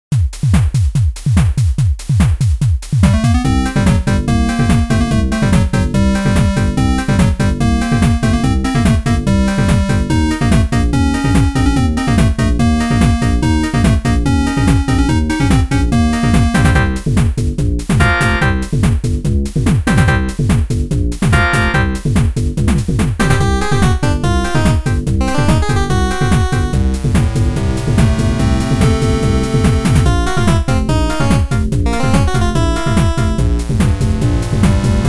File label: battle; marioRPG